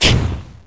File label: explode, weapons